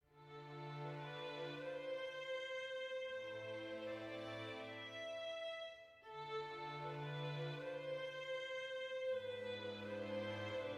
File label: sad soft